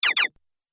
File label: Double Laser Shot; Double Laser Shot 04; Laser Gun Sound Effects; Sci-Fi Laser Sound Effect; Andrew Fleming